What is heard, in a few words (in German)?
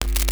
KNISTER, NOISE; Xsample VOL-9